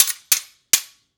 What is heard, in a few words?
Knife Sword Hit Multiple; Soundopolis; Blades; Fienup